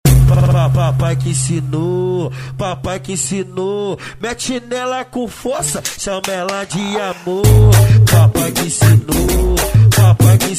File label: especial funk bass